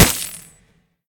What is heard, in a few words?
pwr hit; serqet; smite